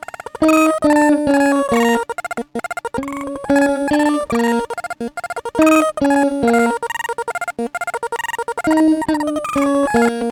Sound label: guitar pitchshift arpegio